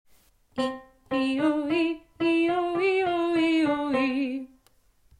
staccato; Cresta de agua; Ri ioi

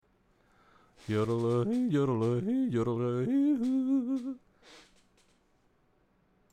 Yodel male

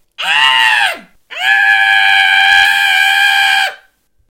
Rattle

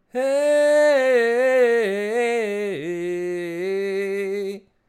Ornamentacion male

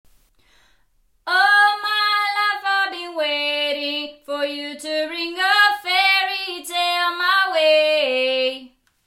Twang oral

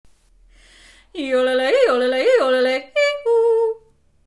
Yodel

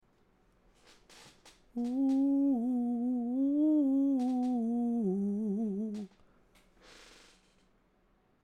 Ornamentacion male

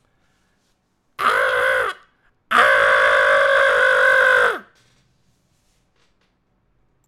Rattle male

